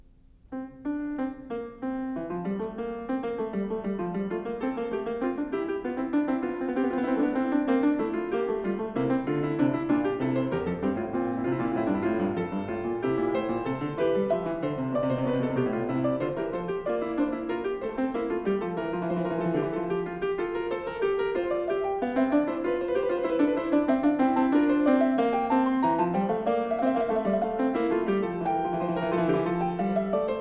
Fugue No. 11 in F major, Bach, Well-Tempered Clavier; BWV856